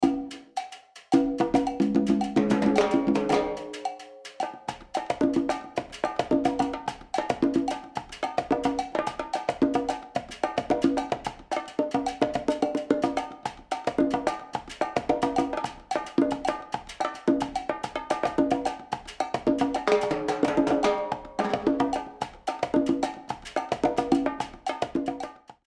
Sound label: Latin Percussion